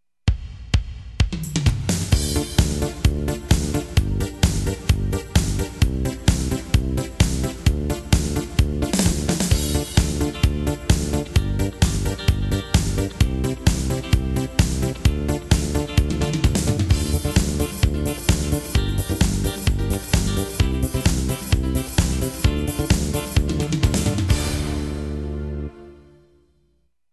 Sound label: Techno newave; yamaha